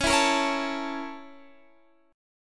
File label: Bb Minor Major 7th Flat 5th